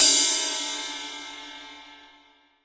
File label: 20 TIP, 20INCH SAB RIDE